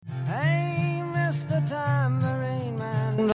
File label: Bass Line